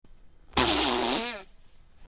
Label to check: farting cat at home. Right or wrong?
Right